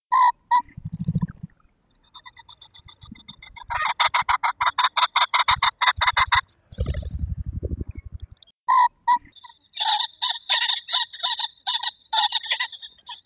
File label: ring necked pheasant